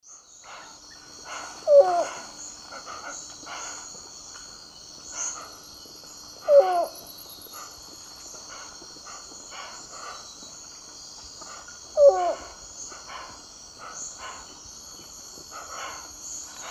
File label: Zebrilus undulatus, song; S. America; Eastern Lowlands